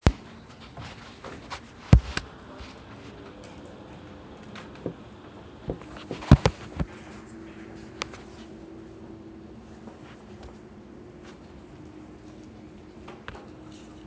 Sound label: Library